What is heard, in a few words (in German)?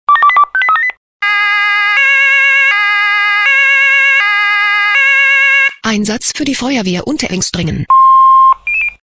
SMS Alarmierungstone; Unterengstr